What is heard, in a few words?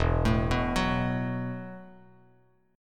E Suspended 2nd Suspended 4th E, Bass Standard